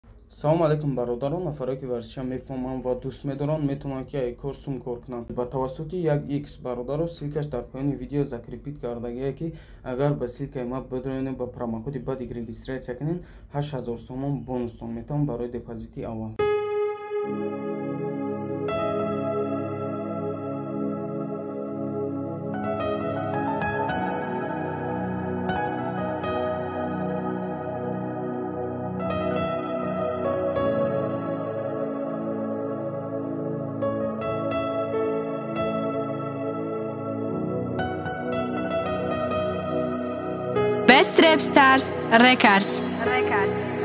rap

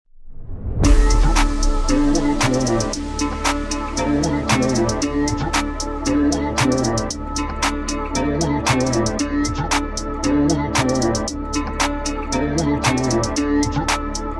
deep house beat car music; усиление басов

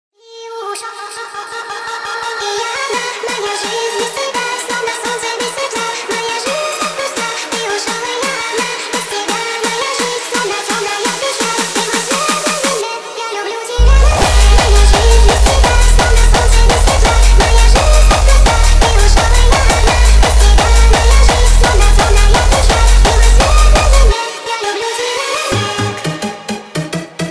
hardstyle remix

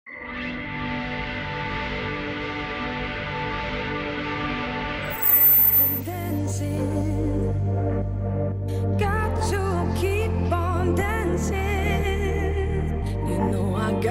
nu disco deep house music

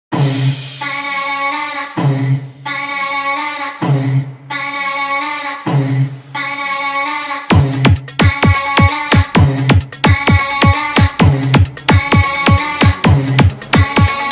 arabic music disco remix

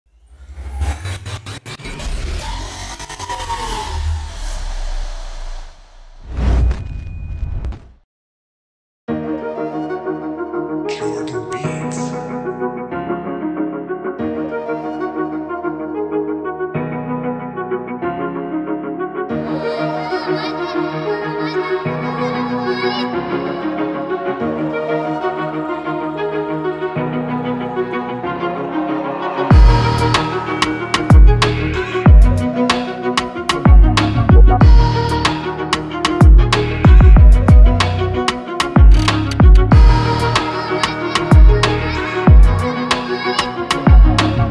uplifting violin rap beat motivational type

